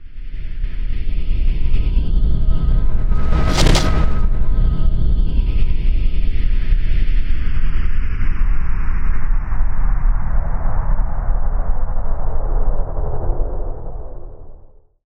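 something stuck in there forward reverse, Cinematic Metal Impacts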